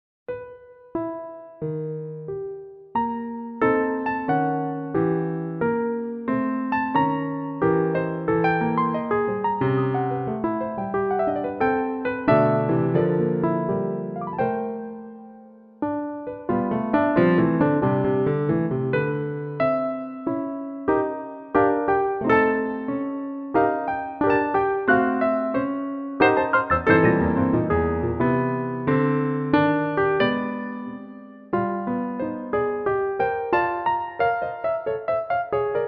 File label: Piano